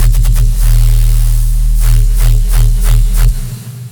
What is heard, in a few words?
Dist LFO Tones; neuro; BIG5AUDIO